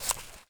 holster out, weapons firearms

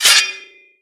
pullout

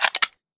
mp40 clip in; weapons; dod mp40

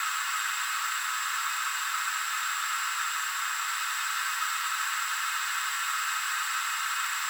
arrow fly loop, bow; bow deerhunter

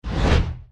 deathclaw swing; npc

